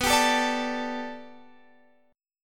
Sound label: B Minor 7th Sharp 5th B Guitar Standard, chord; strummed; capo 9